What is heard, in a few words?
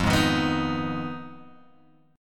F Minor 7th Flat 5th F down Guitar Open G